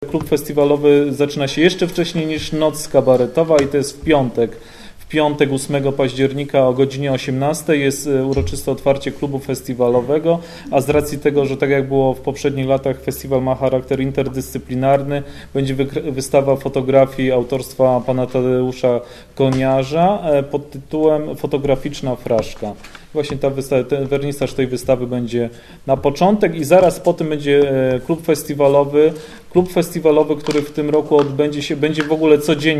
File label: cafe piano; talia